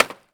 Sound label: wood